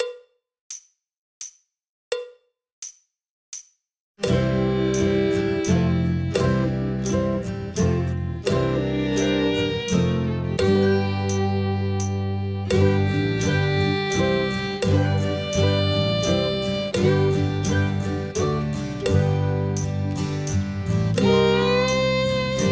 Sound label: Drum Kit Waltz playalong; Pre-Grade Higher; backing tracks; MTB Exams; MTB Drums Pre-Grade Higher Handbook